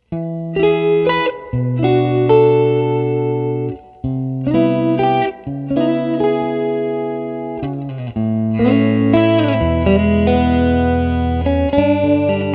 Both Input Rhythm Tele Neck and Bridge Melody Tele Neck